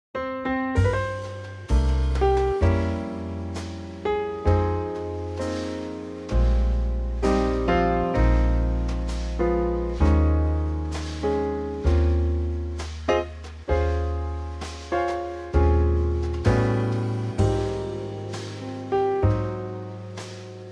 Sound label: Ab Trio; key - Ab - vocal range - Bb to Eb